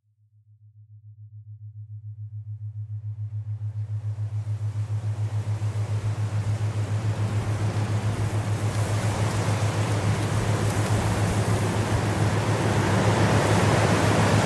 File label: Pure Relaxation Low